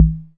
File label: pops con, Korg MiniPops; DRUM MACHINES